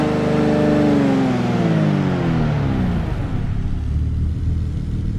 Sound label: slowdown slow, vehicles cars; lwcars